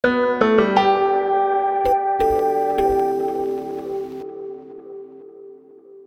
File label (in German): Kurzjingle